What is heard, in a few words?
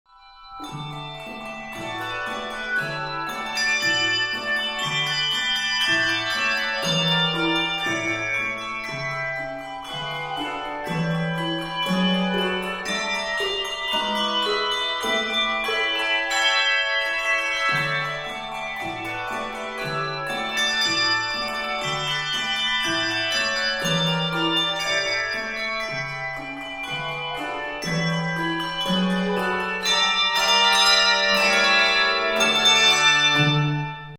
dance of the reed flutes